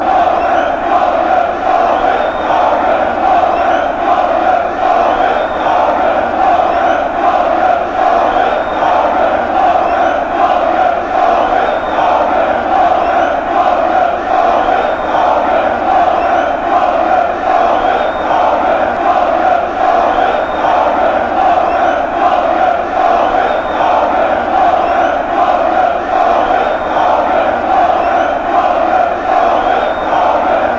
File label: chant, fanchants; genk